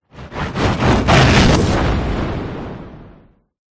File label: science fiction ufo spacecraft fly by on fire explode; zapsplat